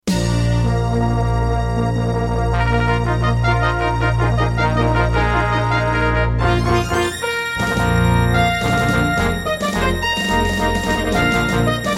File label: Intro, Terratec MiniWaveSystem WT64; Dark Forces